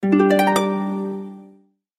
short sms

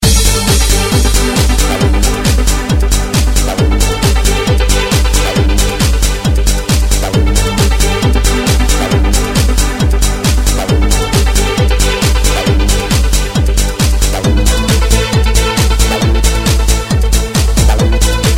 Rainbow Tone

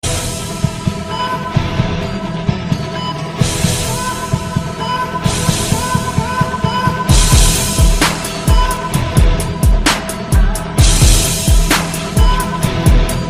hip hop; naruto

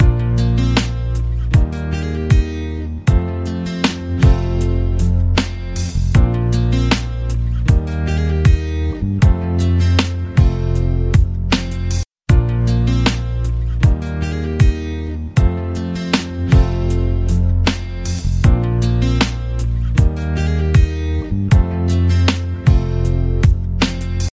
guitar

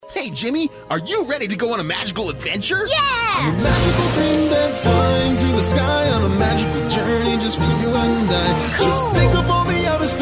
Magical Dream Bed

